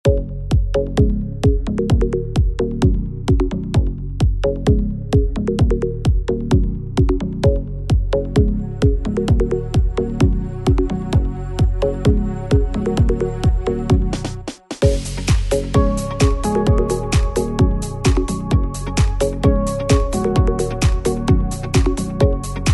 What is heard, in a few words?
urban; Background Music